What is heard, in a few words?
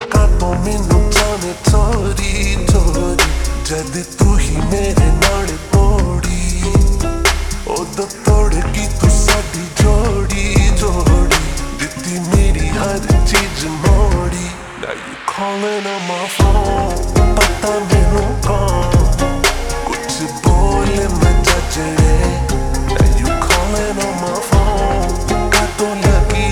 Slow Reverb Version, Ringtone